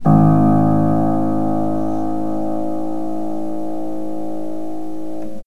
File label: Piano